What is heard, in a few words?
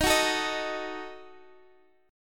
D sharp Diminished, Guitar, Half Step Down, down; D#dim